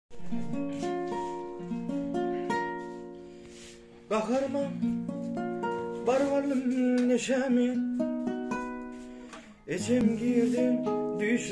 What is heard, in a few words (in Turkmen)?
gitara turkmen aydym